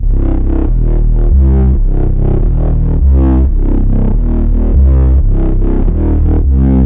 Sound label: Trance Bassloop 140bpm, Bass Arps